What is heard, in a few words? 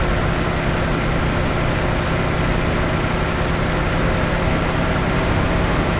m1 engine rpm 66, tanks; War Thunder